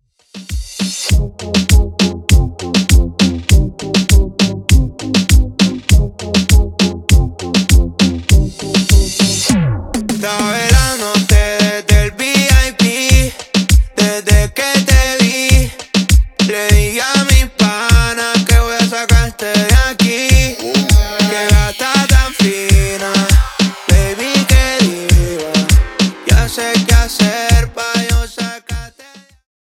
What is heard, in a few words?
Remix Intro 100 Bpm; Prodeejay Remix